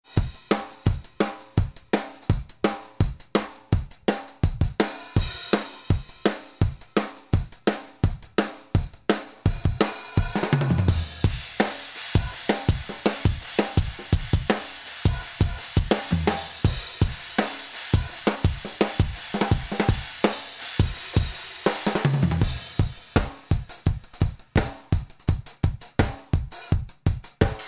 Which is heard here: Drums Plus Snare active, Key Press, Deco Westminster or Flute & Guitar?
Drums Plus Snare active